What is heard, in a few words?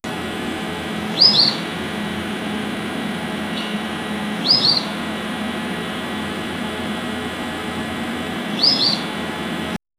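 calm and tranquil, air conditioning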